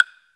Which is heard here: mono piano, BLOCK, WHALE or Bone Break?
BLOCK